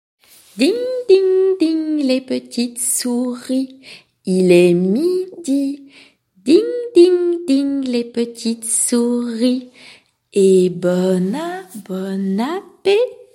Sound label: ding ding ding